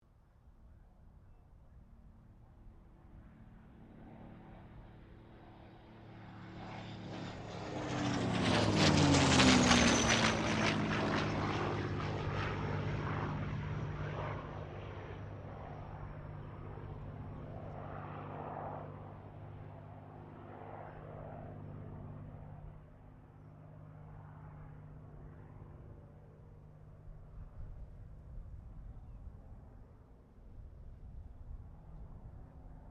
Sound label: AIRCRAFT CA-18 MUSTANG PASS; P51 /CAC-18 Mustang; Airplanes; Sound Effects:Vehicles